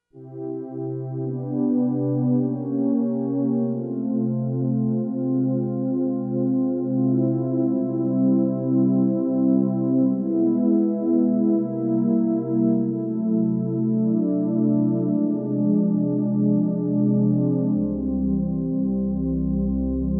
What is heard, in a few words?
string quartet